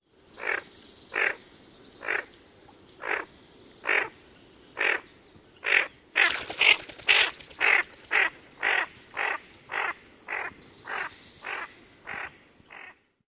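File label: female takes flight, Ring-necked Duck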